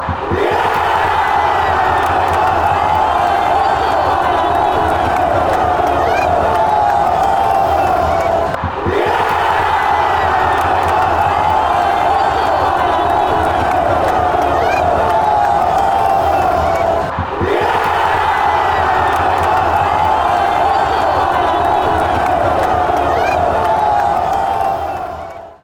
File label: goal